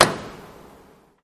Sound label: Bed Motor Elect End; latches & bolts